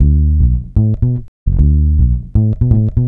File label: Electric Bass; Basses